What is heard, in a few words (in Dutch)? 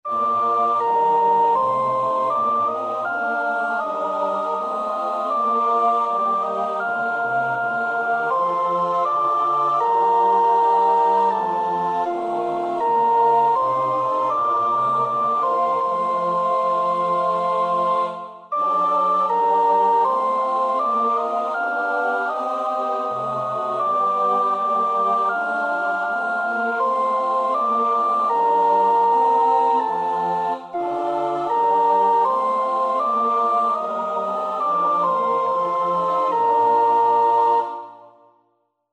sopraan; Bach (Johann Sebastiaan); BWV 044; So sei nun, Seele, deine